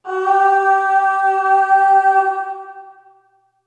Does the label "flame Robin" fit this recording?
No